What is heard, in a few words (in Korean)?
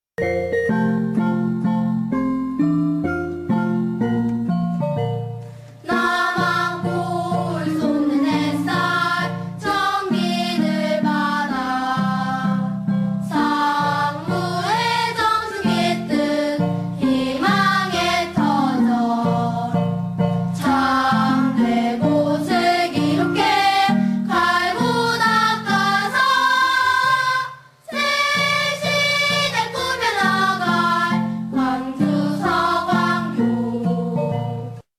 교가; 서광교육; 학교상징; 남안골 솟는 햇살 정기를 받아 상무의 정신깃든 희망의터전 참되고 슬기롭게 갈고닦아서 새시대 꾸며나갈 광주 서광교